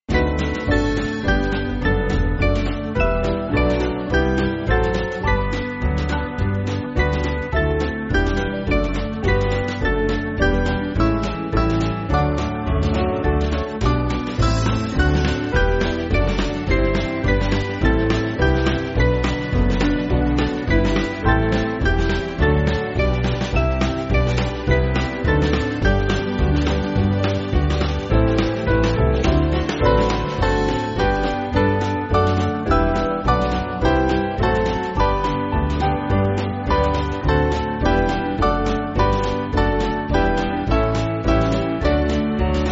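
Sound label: Band, Backing Files for Churches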